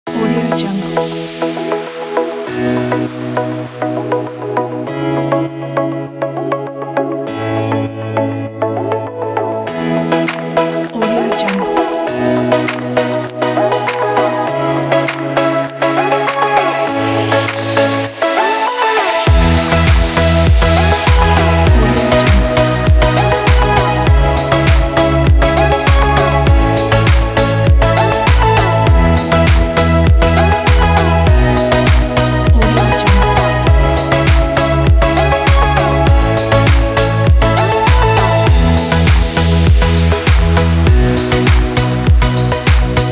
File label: uplifting house edm